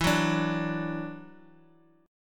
E 7th Suspended 2nd, down

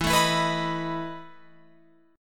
E Major 7th Suspended 4th, Guitar, Open F; EM7sus4 strummed

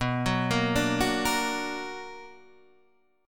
B Minor Major 7th, Guitar, Open D

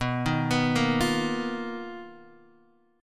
B Major 7th Flat 5th B down Guitar DADGAD

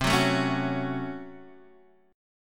Db Major 7th Suspended 4th Sharp 5th C

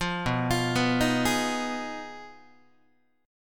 B 6th Flat 5th, down, Guitar; arpeggiated

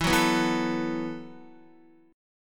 E Suspended 2nd Suspended 4th E, down, Guitar; strummed; JGuitar

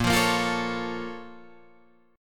Bb Minor Major 7th Bb down Guitar Drop D, chord; BbmM7; strummed; JGuitar

